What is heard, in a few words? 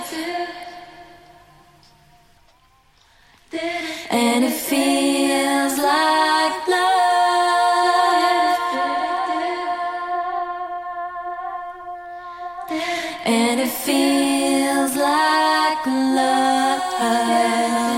acappella